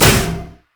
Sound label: orbs missile; Diana